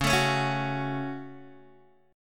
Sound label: Db Suspended 4th Sharp 5th, Guitar, DADGAD